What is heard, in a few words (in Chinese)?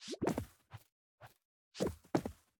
犰狳探头声